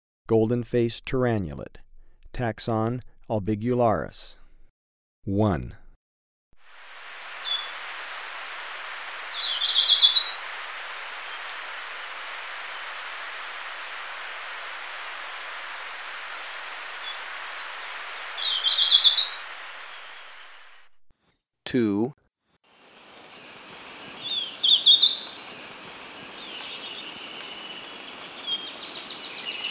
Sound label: Golden-faced Tyrannulet (ssp. albigularis), Birds of Ecuador; S. America